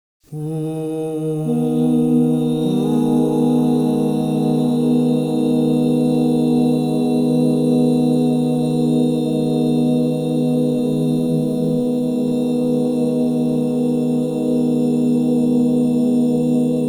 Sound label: Sol-diez minor, crystal bowls; Yoga and sound